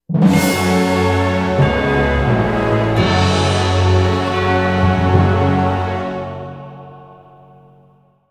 Mus Lose; Hinterland

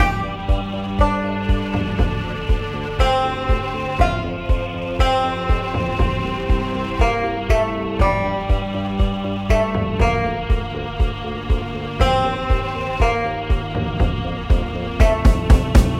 Tension Loop 120bpm 8bar; project zomboid

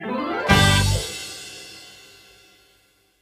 UPBEAT MUSICAL STING; lego dc super villains 2018 nintendo switch gamerip; MX HUB UPBEATMUSICAL STING

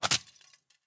plastic break

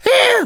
Drums; Twitch Drums

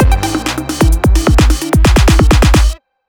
overtime start; Marble It Up Ultra

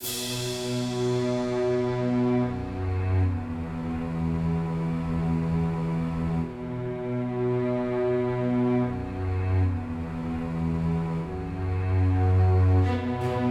music; Castle Storm

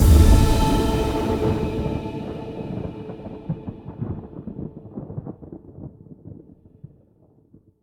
Combo; Mixolumia

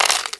Sfx Computer Shop Item Sell

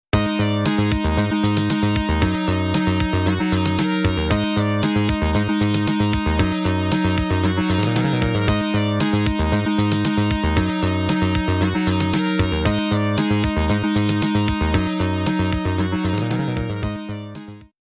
Kinda Spooky; Dog Sled Saga

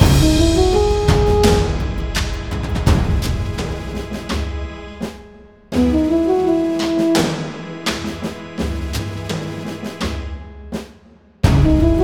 Sub Menu

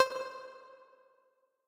Tessellation Move C

